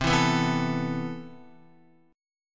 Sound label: C Major 13th, Guitar, chord; strummed; chordshape